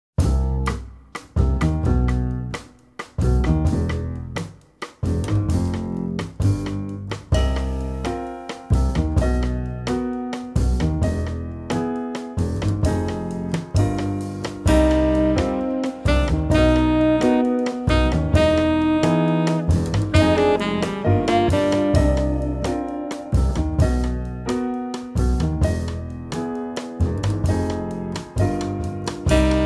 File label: quintet